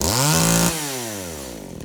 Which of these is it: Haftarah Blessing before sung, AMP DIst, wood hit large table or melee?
melee